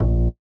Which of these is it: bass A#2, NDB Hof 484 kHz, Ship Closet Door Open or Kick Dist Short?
bass A#2